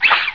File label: Swishin; Broderbund Demo; CarmenJr